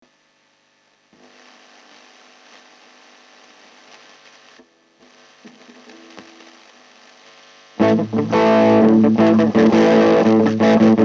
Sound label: Guitar Denoise